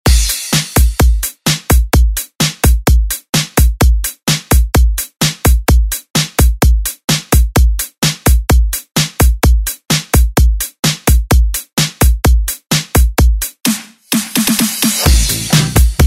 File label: Oldschool Redrum